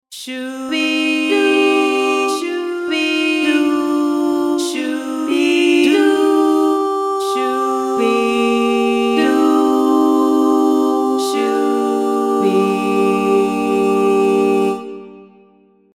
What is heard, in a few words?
poly legato